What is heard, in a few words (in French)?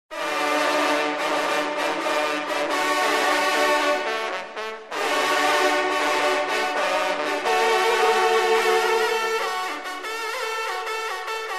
La Grande Fanfare